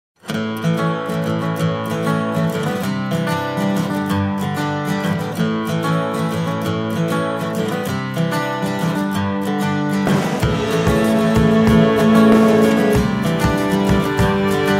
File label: Yearbook, with drums; Soundzabound Volume 5 Disc 2